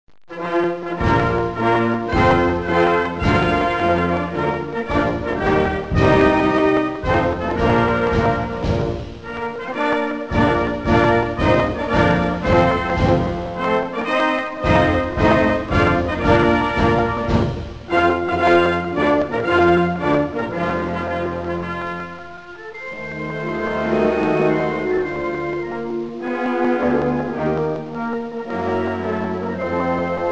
La Marseillase instrumental; Гимны

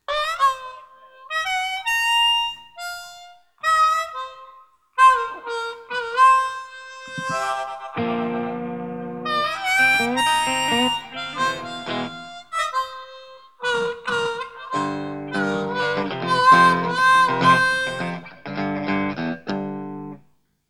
Harp Soundcheck

